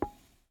Very Soft Hit Wooden Block And Sandish Noise

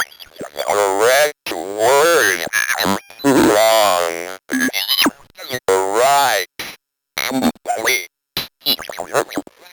Wrong Right

